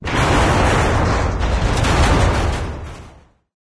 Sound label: van fall; ambient; source engine; episodic; intro